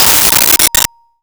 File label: Glock Bb4; Nintendo; July 2020 Gigaleak